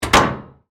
Metal Hatch Close, Scifi, Metal, Hatch, Close, Rocket, Ship, Door, Science, Fiction; Spacecraft